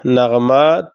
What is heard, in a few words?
melody